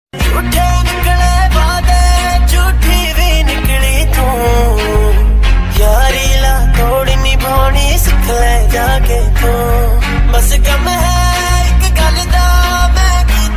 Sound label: Sad Punjabi Ringtone